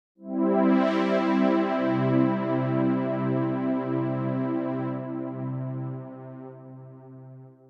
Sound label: Crewmate Victory Sound; Among Us